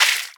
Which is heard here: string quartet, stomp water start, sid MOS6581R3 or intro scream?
stomp water start